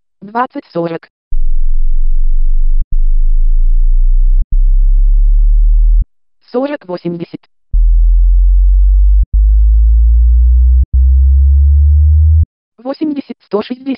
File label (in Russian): oktavnyi; Magnitola Avtozvuk; svip